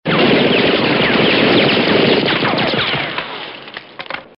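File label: ricochet from machine gun bullets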